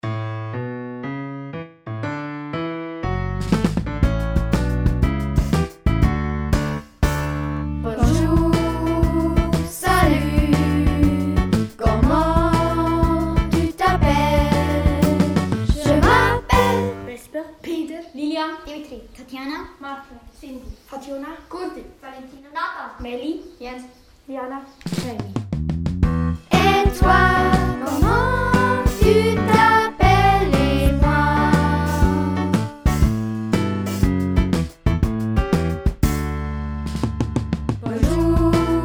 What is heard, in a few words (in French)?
comment ca va vocals